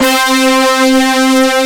BRASS C3; AKAI S-Series CD-ROM Sound Library VOL-2

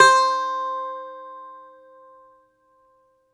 DOMRA, Prima Domra, Plucked Strings

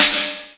Snare, Percussion Hits, Snares

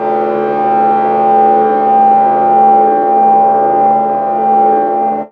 bell g; Chillout (ambient1&2)

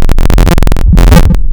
Misc Serial, wavetables; Architecture 32

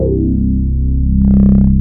Poly 6 Moog

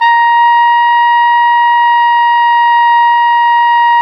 Flugelhorn; Roland L-CDX-03 Disk 2

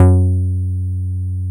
CRISP F#2, BASSES

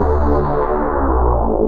D&B Synth Stab; Keys; neuro

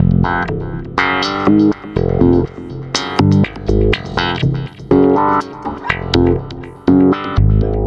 Stick Loop, Stick Bass

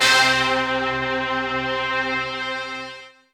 horn; Zero G; Man Machine

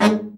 BRA Bari, SAX; Best Service Gigapack 1; BR SAX 2

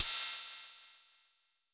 TR-606 Ride Cym; Roland BK-5

